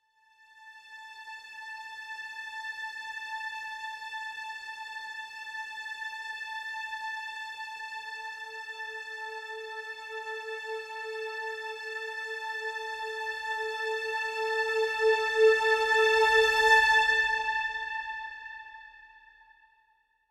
Simple String; Brand X Music; Brand X Music Catalogue - Editor's Essentials

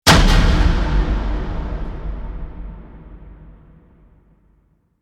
Hit Epic; Ninja Tracks

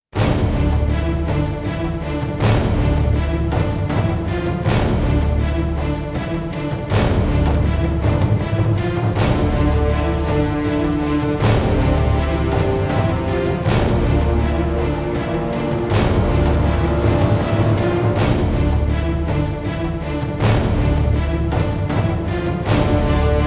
No Guitar

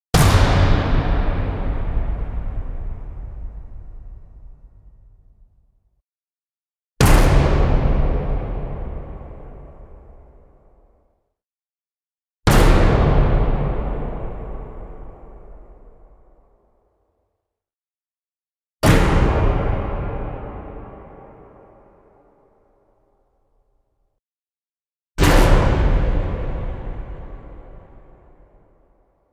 Orchestral Hard Hits; Epic Tools vol. 1

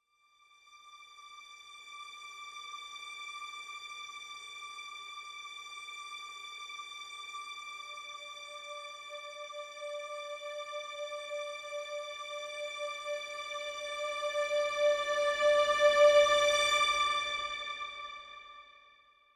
Simple String (D); Brand X Music; Brand X Music Catalogue - Editor's Essentials

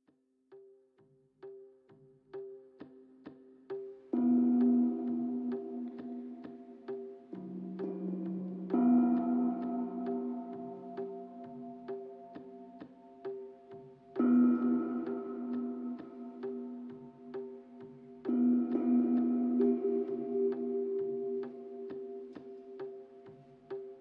Tibetan Bowls for Massage

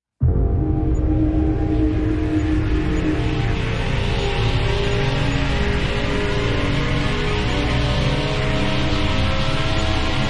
Gargantua Rise; Ninja Tracks

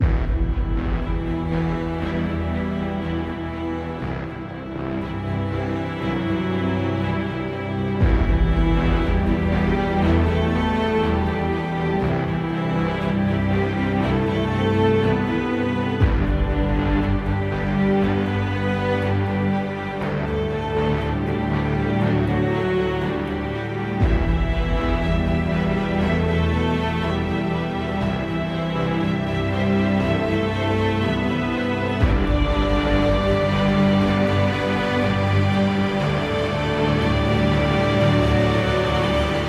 Inspired Ambient

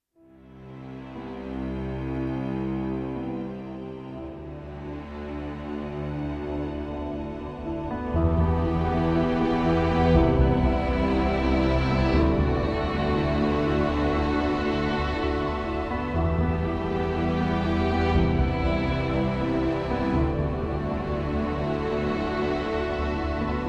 Ambient

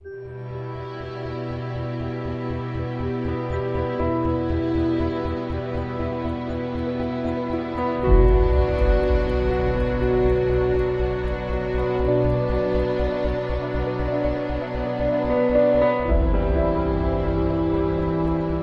Grief Ambient

